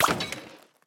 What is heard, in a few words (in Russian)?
Тап для раздела на общей карте